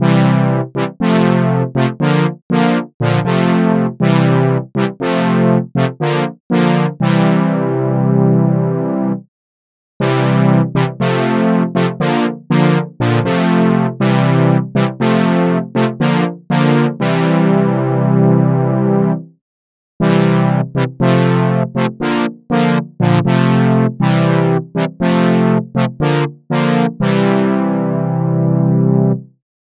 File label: Jupiter 8 Lo Brass